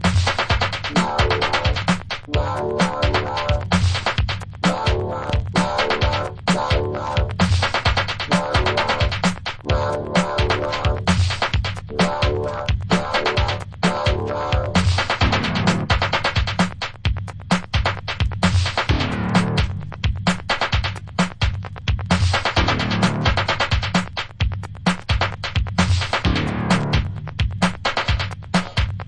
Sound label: dance (long drum version)